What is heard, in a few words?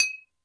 Bottle, Drum Machines Alesis D4